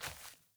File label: Sandals Walk Dirt; Characters; Player